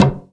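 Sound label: SP-12, drum machine; Best Service Gigapack 2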